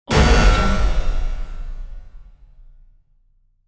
epic powerful buzzer impact

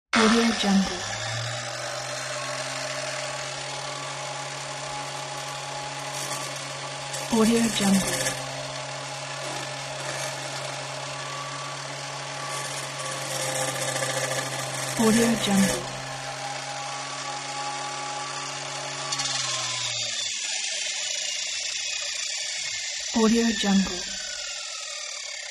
electric saw on metal

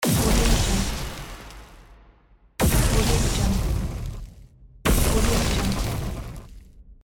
elemental bow fire attack

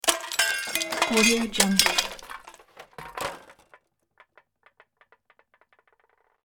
junk debris spill; hollywoodedge

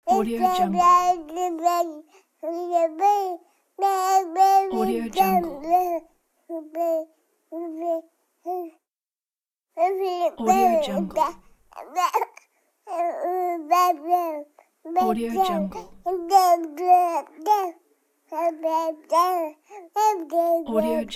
baby talk; djsapa

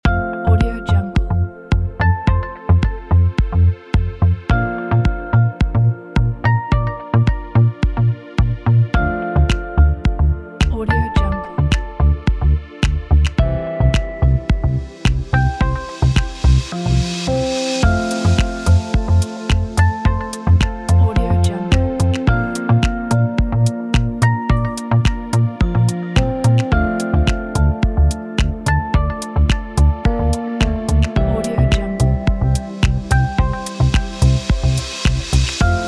ambient dreamy electronic corporate; virto

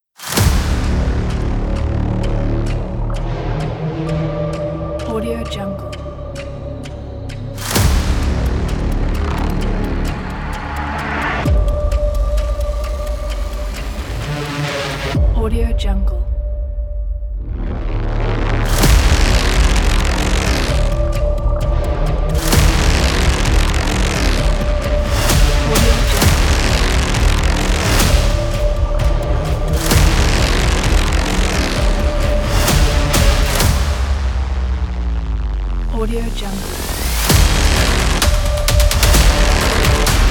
aggressive action trailer; soundbay

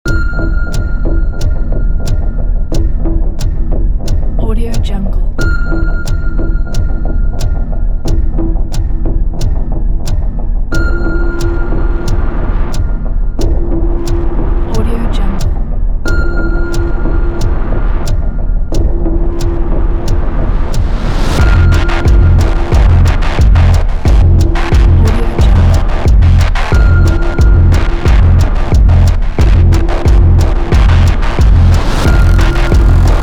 suspense trailer; zbsbrothers

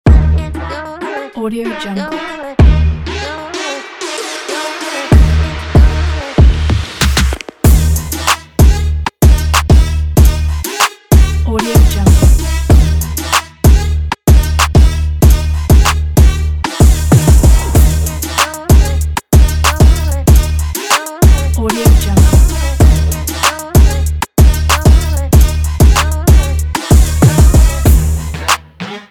energetic trap club beat; trendsound

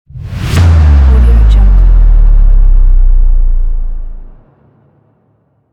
bass drop boom impact

